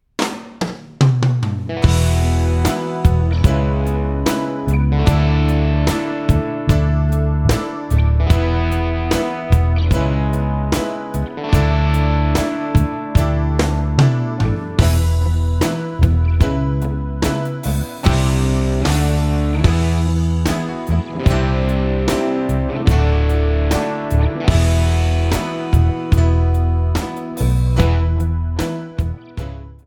B's Rockballad; Bum Bum Tschack 1

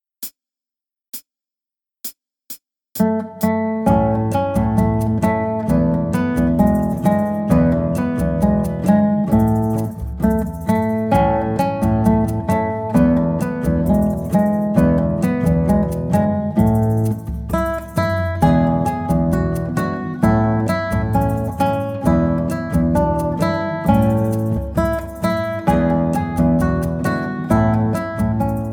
Mozambique (Tanz aus Brasilien), Guitar; Play Guitar Junior mit Schildi

